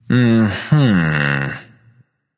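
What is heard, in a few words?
nonverbal agree; smite; voicepack; merlin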